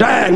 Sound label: Dang; live-beatbox